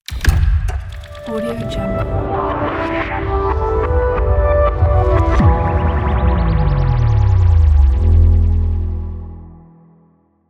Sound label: dark deep logo reveal